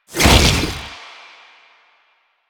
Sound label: Motorcycle Stop; Motorcycle Ninety Nine